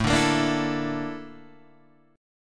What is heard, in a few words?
Bb Major Sharp 11th A, chord